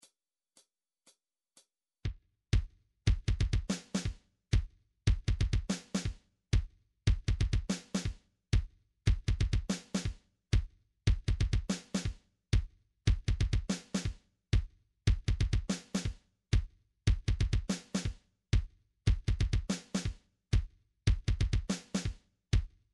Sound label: midi drums drums drum